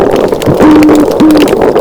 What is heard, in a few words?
toadfish call